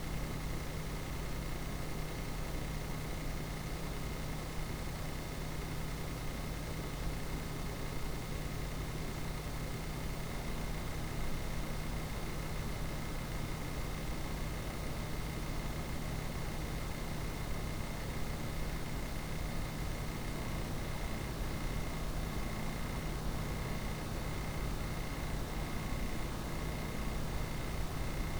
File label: X800XT AC Silencer5 Fan noise (idle,43,50,100)